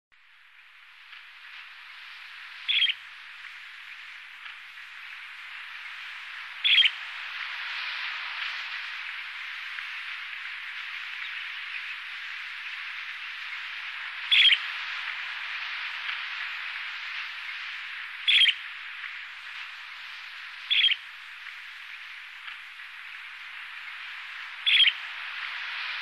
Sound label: Charadrius alexandrinus; Sakhalin; Kuril; sea; priroda sakhalina i kuril